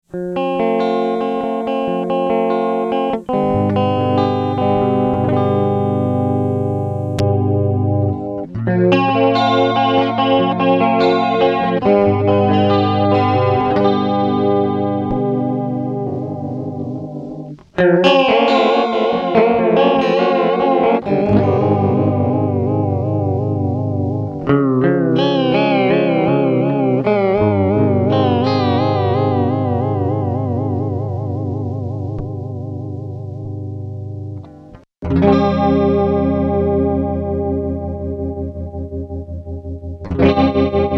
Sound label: chorus vibrato tremolo